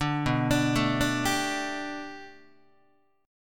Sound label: B-Minor 7th Sharp 5th B down Guitar; Bm7#5 arpeggiated; JGuitar